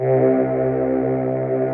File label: ANA CHOIR; Pro-Rec - Synthsonic; JV ANALOG